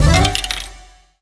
Coin In; pirate hook windows gamerip 2012

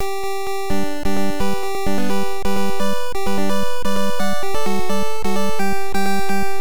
Game Start; Vs. Raid on Bungeling Bay; VS System; arcade

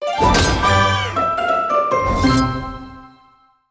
Crunchy Finish

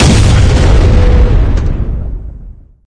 Vehicles; unreal development kit; Goliath

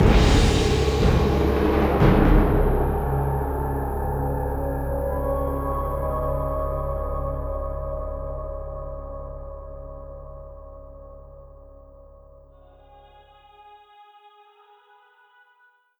Ambient Sting; Prototype

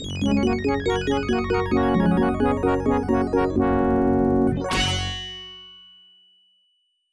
OPL2 Fanfare; Raptor: Call of the Shadows; MS-DOS; Apogee Fanfare

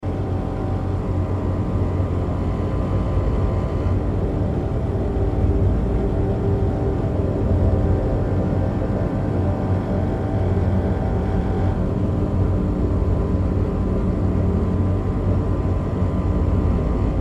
Level 3 Zone 1 Ambience

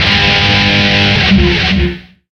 Guitar mega power; Back to the Future: The Game; Ep. 1 It's About Time

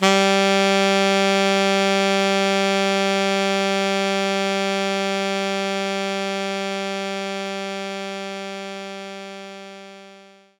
Alto Sax G3; knights try

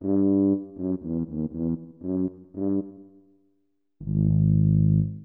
Pirates Realm Fanfare; Glover; Nintendo 64

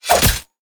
Fight Attack Sword; Mighty Party; Android

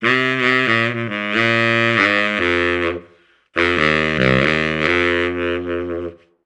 Tuba; simpsons hit run ps2

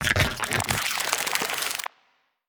Bug Transformation; We Are the Dwarves

